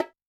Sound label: Bongo Hi; Yamaha DTXpress-II; Perc